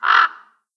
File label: raven; ambient soundscapes; dota